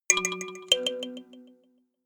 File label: notification kalimba delay fourth up